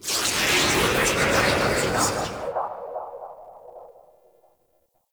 Assassins Spawn B; Warframe; Ambience; Orokin Derelict; Red Veil Temple

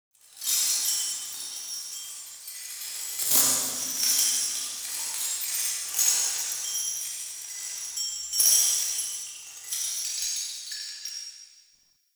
Dungeon Island Chains, Ambience; Warframe; Duviri

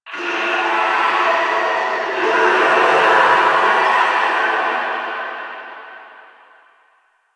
Infested Distant Sewer Grate Screams; Warframe; Ambience; Nineteen Ninety Nine; DE

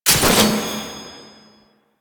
Rocket Launcher Mech; Weapons; DE; Warframe; Tenno; Lasrian

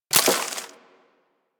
Bullet Impact Debris Wood

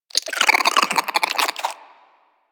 Vocal Idle, Hunting Critters; Warframe; Cryptilex

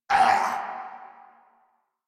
Pain Grineer; Warframe; Grineer Chem Nox

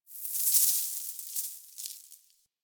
Mountain Pass Snow Hit Player; Warframe; Ambience; The War Within

